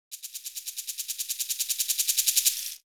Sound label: shaker FX